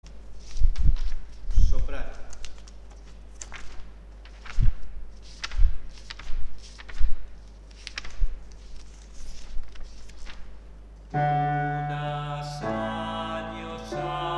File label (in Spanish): una sanhosa porfia sop